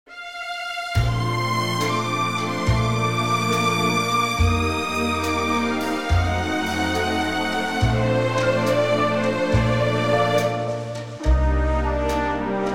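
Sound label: orchestra Bb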